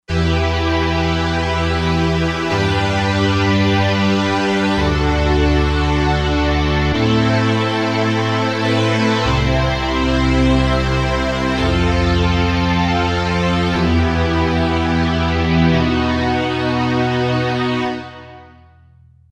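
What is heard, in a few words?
strings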